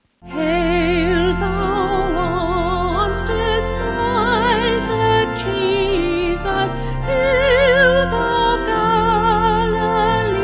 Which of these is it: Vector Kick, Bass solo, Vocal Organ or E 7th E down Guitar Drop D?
Vocal Organ